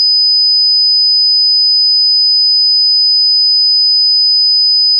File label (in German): sinus 5khz